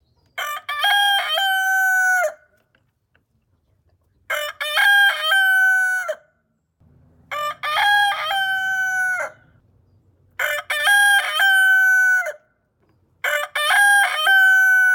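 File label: cock-a-doodle-doo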